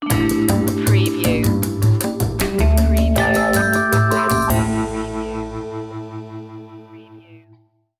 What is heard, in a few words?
jazzy opener